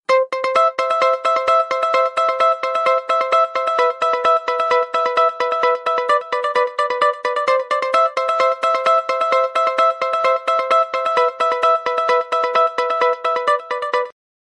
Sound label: Bell Remix Ringtone; Samsung